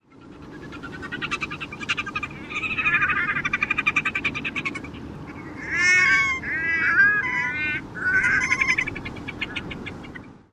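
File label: RNGR, Red-necked Grebe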